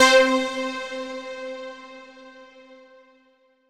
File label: Octave Synth Hit